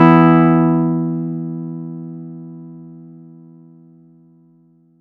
Chord; ModelCycles

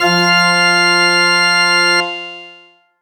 organ F; Polyend; ARC Noise

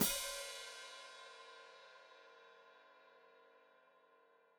Hat Closed Open, Hi-Hat

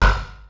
Anville, Drum&Bass Hits & Bits; Total Drum&Bass; 90 s Sample CDs

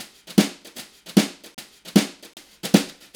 Live Shuffle 152, Lounge Drumz

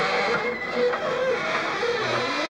TRUCK JUN, Transportation, Truck Junk

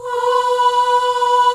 MIXED CHORUS

